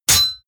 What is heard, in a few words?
sword hit metal